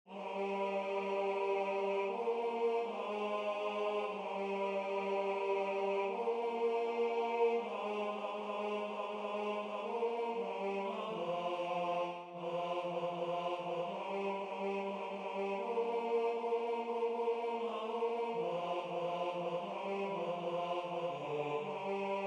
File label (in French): basse